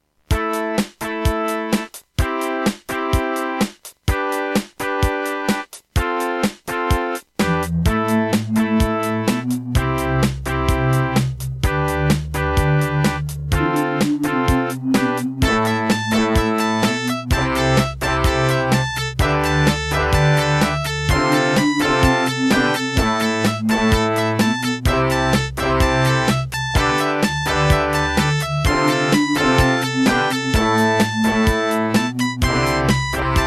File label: Serdaco Qwave; raptor call of the shadows